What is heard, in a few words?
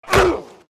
American football tackle grunt